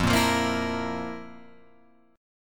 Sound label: F Major 7th Suspended 4th, Guitar, Open F; FM7sus4 strummed; JGuitar